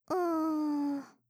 うーん

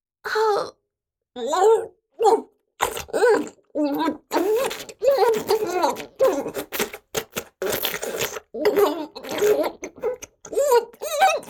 イラマチオ(強):頭を鷲掴みにされ激しく腰を振られる, チュパ音

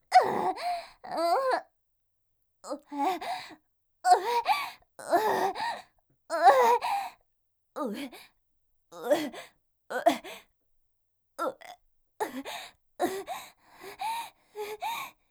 おえおえ

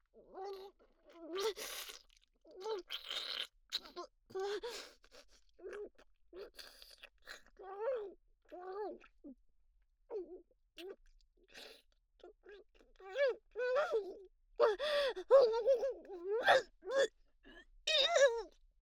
イラマチオ・恐怖に泣きながら精液を飲むも限界で吐いてしまう風な声; おとなし少女系カノジョ

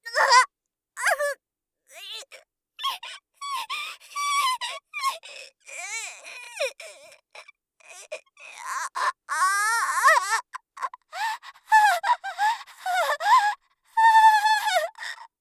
鋭痛

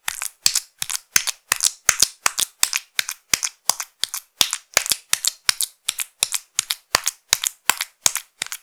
パンパン ループ, ピストン運動 激しめ

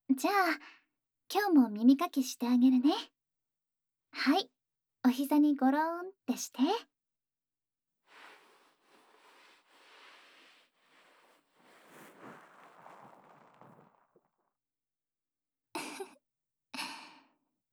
2人の親密な耳かき

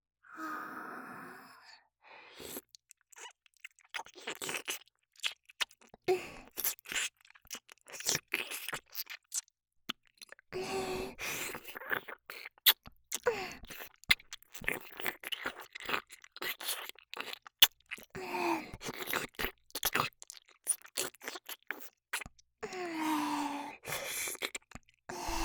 耳舐め リピート 右耳

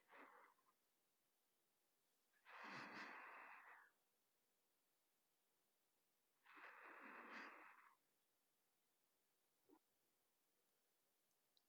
寝息ループ （バイノーラルマイク）, 吐息ループファイル

